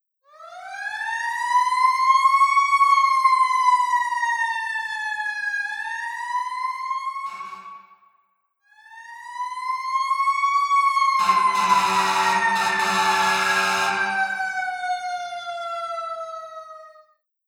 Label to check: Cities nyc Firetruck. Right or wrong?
Right